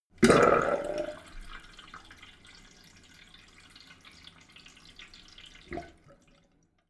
sink drain hard short

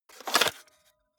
cassette out of cassette player

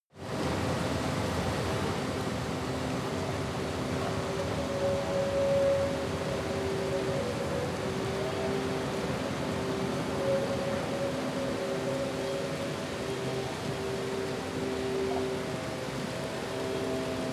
rain traffic construction noise

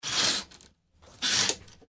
curtains closing

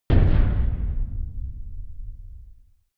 big metal impact low soft

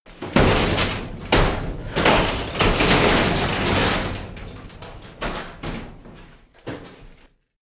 metal crash medium

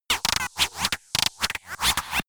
robot small helping droid

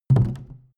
canister empty put down

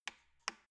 embedded button press

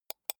computer mouse wheel click soft

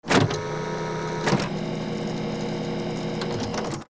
electric door

